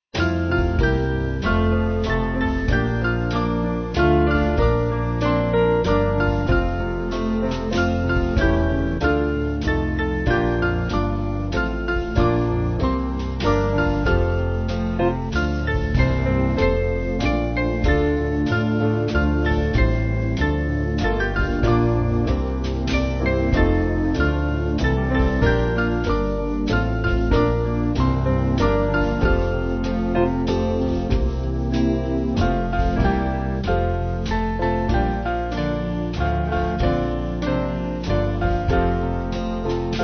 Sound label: Beach Spring Band; Come Ye Sinners